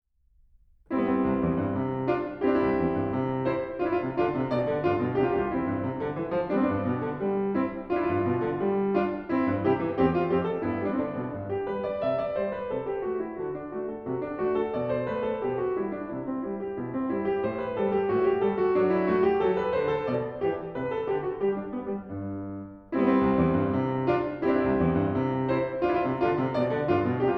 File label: Piano; Bach; Prelude in C; BWV 933